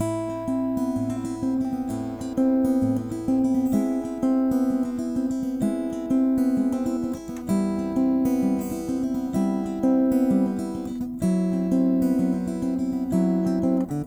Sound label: Takamine P5NC